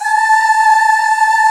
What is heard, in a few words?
MIDDLE OOHS